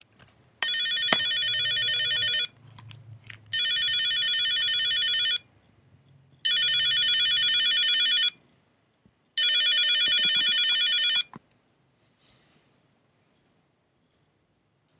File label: ring